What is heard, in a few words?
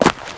creep footstep; Radiant creep